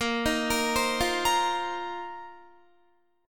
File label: Bb Suspended 2nd Suspended 4th, Guitar; Bbsus2sus4 arpeggiated